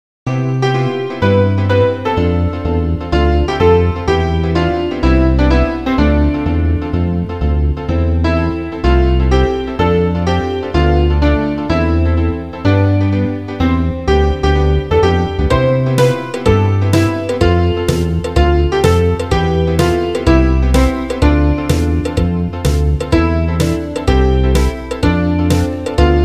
piano drum

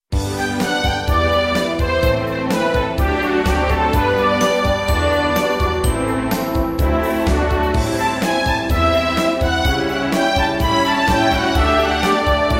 town

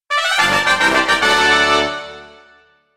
bright jingle

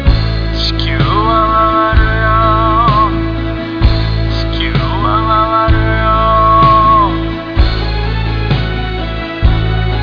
beats

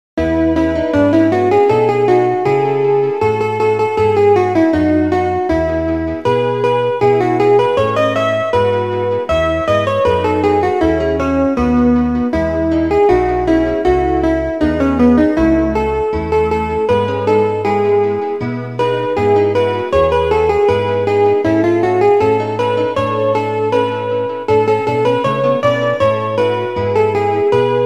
classical licks

